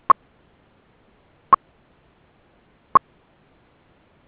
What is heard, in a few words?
Beacon Mode 0